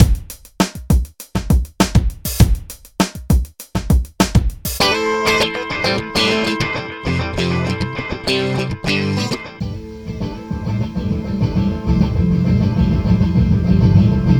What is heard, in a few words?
e-mu esi-2000 sounds, transform multiply